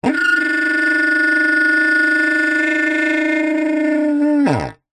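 foley blowing air into pipe with squeaky mouth piece weird sound design element; zapsplat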